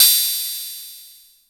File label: Hat Open, drum kit; Polyend; Stazma & Concrete Collage